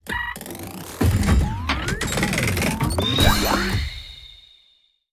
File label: Suit Cockpit Boot Up; Relic